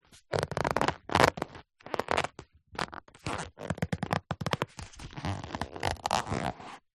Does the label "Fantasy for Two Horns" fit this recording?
No